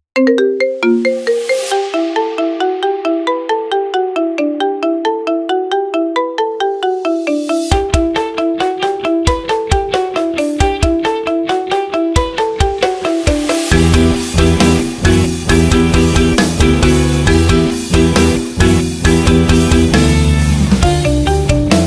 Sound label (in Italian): Marimba suoneria; Death Note